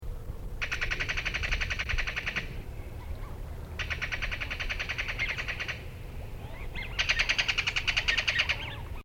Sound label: ringed kingfisher call